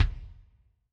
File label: Lo Room K, Alesis SR16